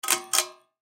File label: Lamp Turn On, Foley Lamp Turn On Pull String; Lamp Turn On Pull String Fast; Lamps; Household; Sound Effects:Household; SoundEffects-Blastwave